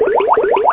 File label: Shark; Mosaic Magic